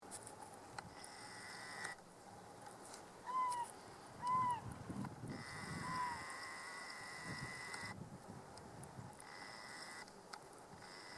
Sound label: honey-buzzard mating call; staward